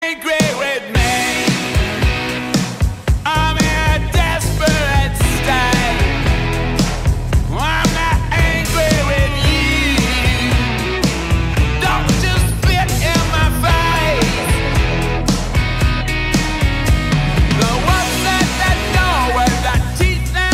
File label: angry